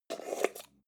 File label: jar plastic midsize open slow